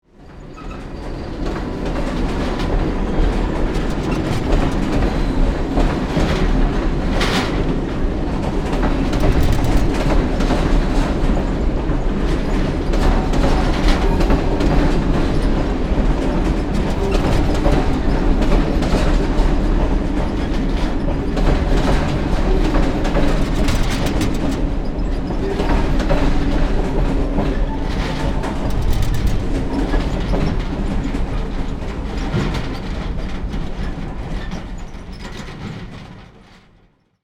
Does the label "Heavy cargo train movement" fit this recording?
Yes